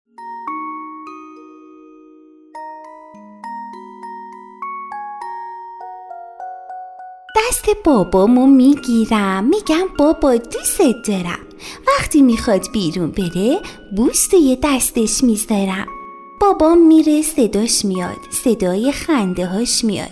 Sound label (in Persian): صوت خنده بابا